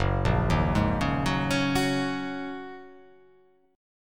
F sharp 6th Add 9th, Guitar; arpeggiated; JGuitar

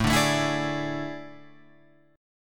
A Major 7th Suspended 4th, Guitar, Open F; AM7sus4; strummed; JGuitar

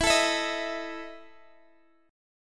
E Major 7th Suspended 4th E down Guitar DADGAD

